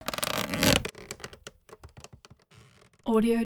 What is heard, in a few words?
friction organic